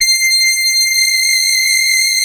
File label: FAT LEAD, Powered DX; Wizoo